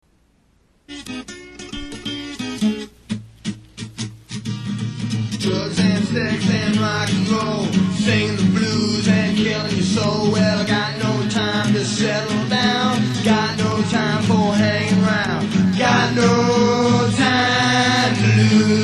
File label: Acoustic; Got No Time To Lose